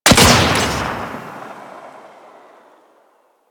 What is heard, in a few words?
Torpedo Pistol Shot 3D, weapons; Grn Torpedo Pistol